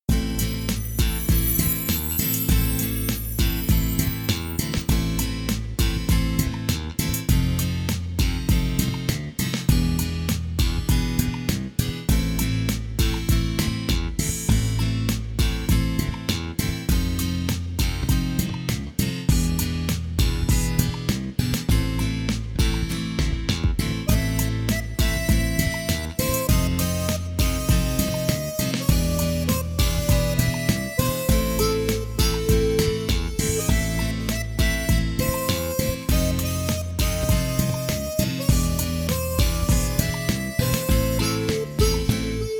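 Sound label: backing track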